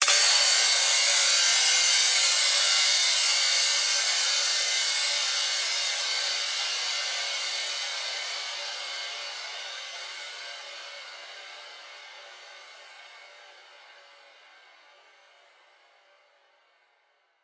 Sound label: Crash